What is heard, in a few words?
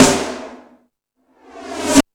Hip House, Drum Machines E-MU Pro-Cussion